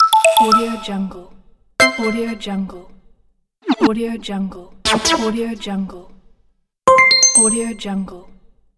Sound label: games menu sound effect